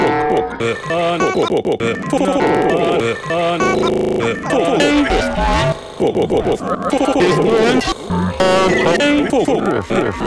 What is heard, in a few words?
50 BPM